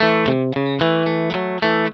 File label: ROCK A 110, Vintage Blues Guitar; USB Soundscan vol.22; AKAI; ROCK2